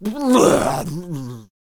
Banner Bearer Death Vocals

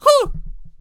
Blunderbuss Attack Vocals

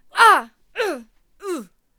Bomb Thrower Death Vocals

